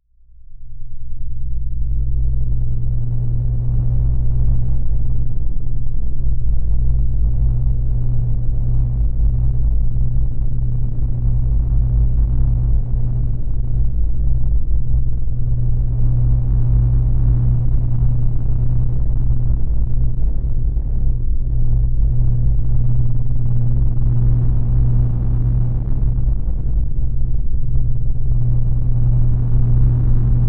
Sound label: DRONE DISTROTI, Drone Distrotion Soft Feedback Wash; Blastwave